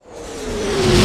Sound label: explosion lead in; 1944 Battle Of The Bulge